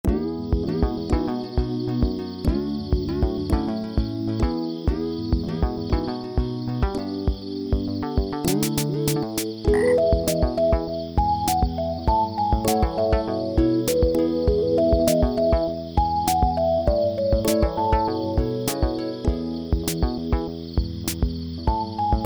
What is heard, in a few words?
BGM Swamp; Adventure of Heroes; Android